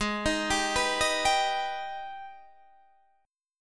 G sharp Minor Major 7th G sharp down Guitar Open D